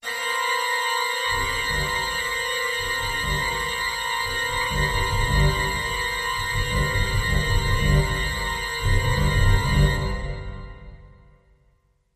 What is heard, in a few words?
Pipe Organ Horror Accent